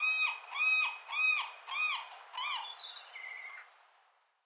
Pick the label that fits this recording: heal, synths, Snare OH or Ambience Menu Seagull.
Ambience Menu Seagull